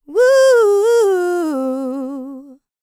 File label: LICKS, Vocal Planet, R&B; ILIO; Dance; LT R+B